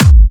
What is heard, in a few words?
Clubby Kicks, Bassdrums; Vengeance - Essential Clubsounds Vol.5